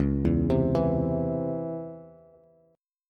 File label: C sharp Minor Major 7th C sharp Bass; C#mM7 arpeggiated; 9,7,10,9; JGuitar